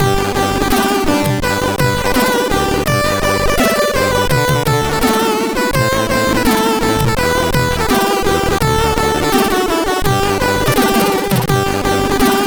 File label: Happy Birthday, sid CSG8580R5